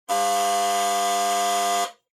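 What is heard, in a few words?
Doorbell Mechanical Buzzer Close 50Hz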